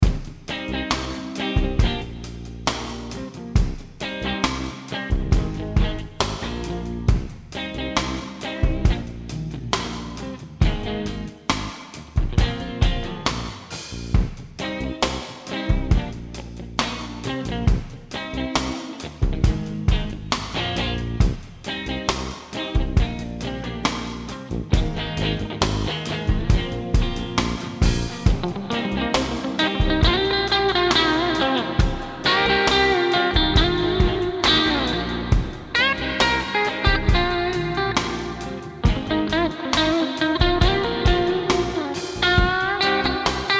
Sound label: D minor jam